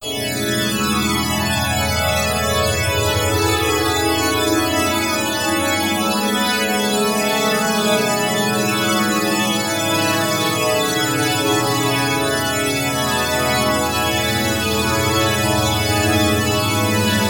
Dreamscape Drone

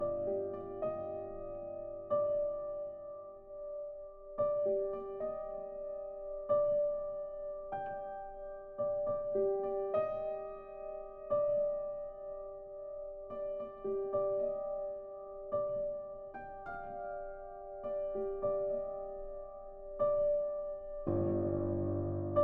piano theme loop, simple horror piano music

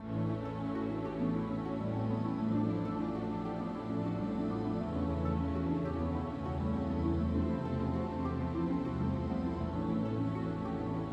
RPG Title Screen Music; Fantastic Space

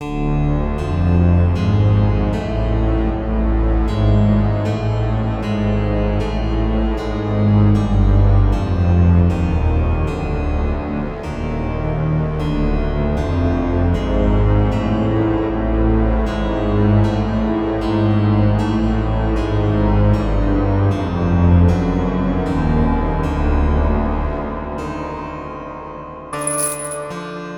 dungeon

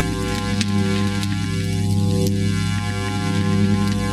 charge, electricity; Electricity Game Sound Pack

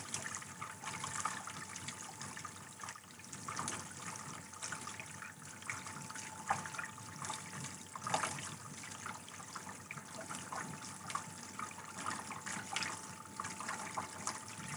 bg water running

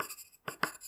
pencil scribble, writing scribbles

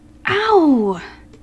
oww

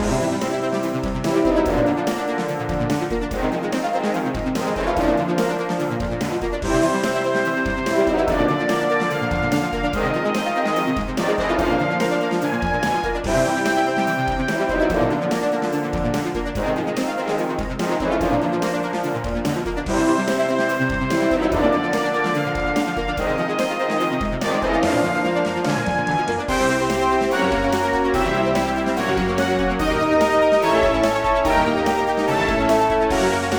racing through asteroids loop

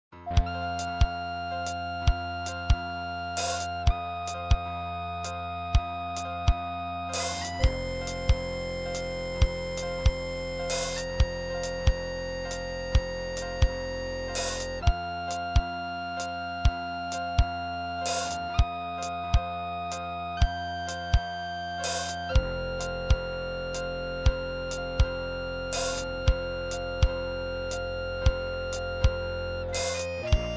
bagpipes with drums

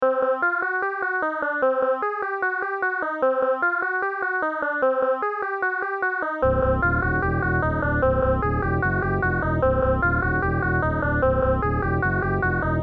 Theme

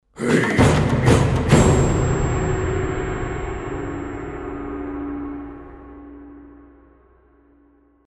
level up

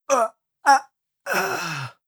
death groan male

